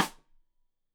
Side Stick Stereo; Deeply Sampled Drums; SMDrum

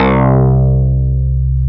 KEY FM, FM Clavi; Club-50 - Foundations Roland; Clavinets

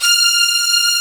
STR 2 SEC F5, HALL STRINGS; AKAI S-Series CD-ROM Sound Library VOL-7

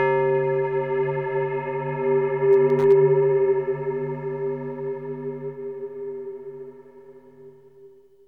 BEL OF DOOM, SYNTH FX; AMG; Global Trance Mission VOL-2

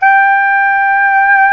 Soprano SAX mp G5; Giga Samples Collection

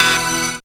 synth e, Dance Synths, Stabs & Hooks; Best Service ProSamples vol.37

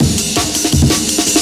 breaks no quantisation, JUNGLE; amen; M8JUNGLEPAK1

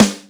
Snare Light, Korg 05R-W Korg Snares; 300 Drum Machines

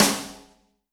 snare, Zoom MRT-3B

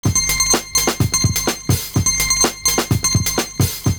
MANDOLIN DRM; Univers Sons - Kitch 70s

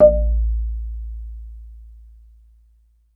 BASS MARI, Pitched Percussn, Bass Bamboo Mrmb; E-MU Producer Series Vol. 7 – Old World Instruments

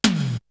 Tom, Drums; ZERO G - Datafile DISC 3

